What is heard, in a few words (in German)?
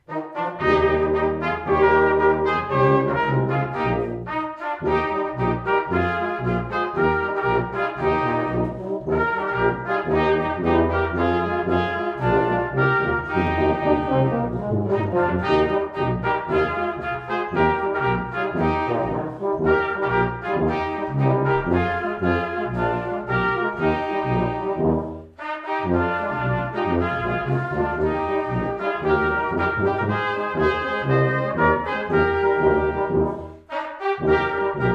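muss i denn, Blechbläser Quintett; Muß I denn zum Städtele hinaus; Martin Schmid Blechbläsernoten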